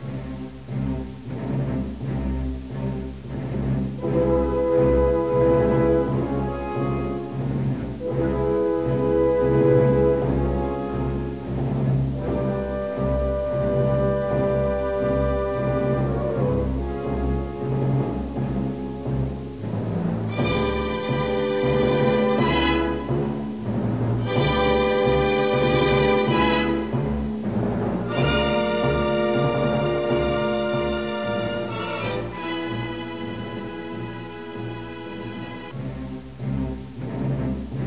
music

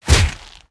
bite hit; weapons

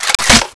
rpg load, weapons

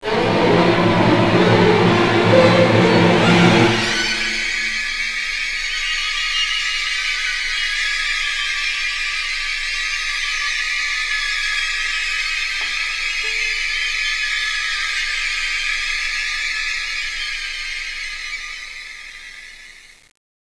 building fall; endoftheworld

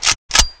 m82 cock; firearms

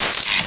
houndeye alert; gearbox

